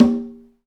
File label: PRC XCONGA, Skin Drums